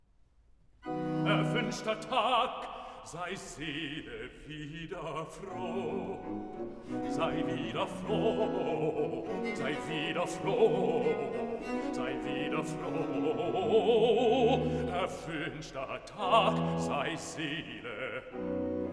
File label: Cantata, Recitativo (Bass); BWV 31 'Der Himmel lacht! Die Erde jubilieret'; Bach; Eisenach; Easter Sun & Mon